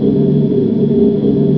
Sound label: pipe, air exchange; hl2